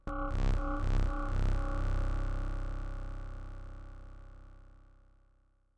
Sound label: womp womp; SciFi Warehouse Kit